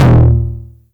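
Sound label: 909 low tom, tape saturation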